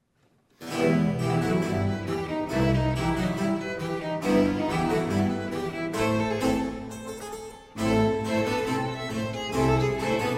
Keyboard Concerto in F Minor, Allegro - Largo - Presto; BWV 1056